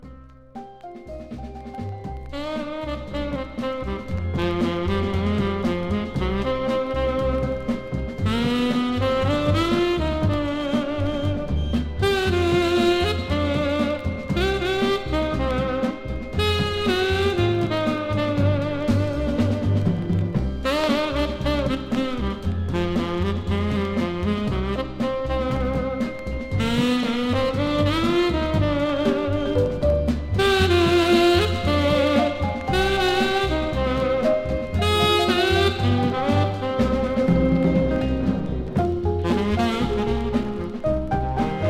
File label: tena sax